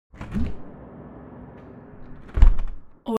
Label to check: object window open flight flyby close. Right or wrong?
Right